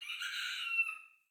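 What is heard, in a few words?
ambience Rooster Oct Up; willyshotchillies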